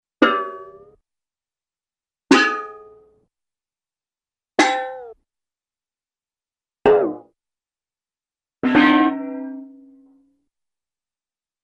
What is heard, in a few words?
Thin Metallic Pot Or Pan Hits, cartoon; Hollywood Edge; Cartoon Trax